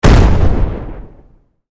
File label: explosion normal